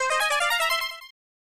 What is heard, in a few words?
Option Select Fanfare; TwinBee: Rainbow Bell Adventure; SNES